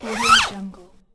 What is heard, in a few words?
zipper